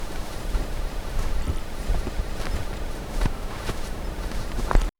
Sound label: soft footsteps on woven c, carpet